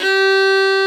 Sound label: fiddle G3; InVision Interactive; Lightware VOL-1; Instruments & Percussions